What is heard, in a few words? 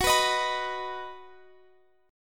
Eb Minor Sharp 5th Gb